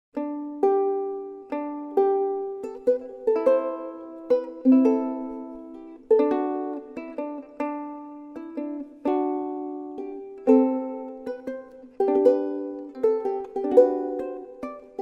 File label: uke; fanny poer